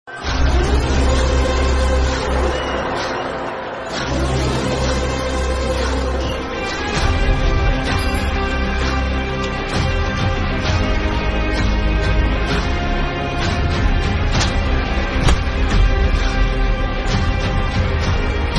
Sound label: Bgm Ringtone; Gangubai